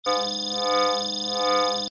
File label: Motion Scan